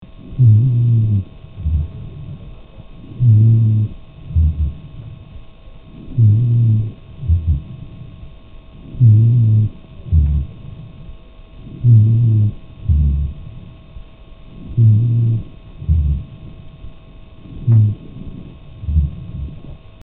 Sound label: Ronchi